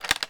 on off, Switch FX; Masterbits

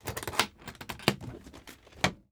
SUITCASE, Tools; AKAI S6000 CD-ROM - Volume 6

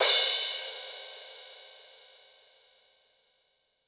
Korg 01W Percussion

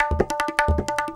Tabla

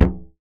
Percussive Hit, contact mic

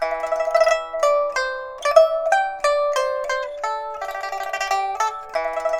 PIPA, 90; INIS - Opium

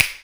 snap, claps

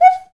PRC XCUICA, Skin Drums

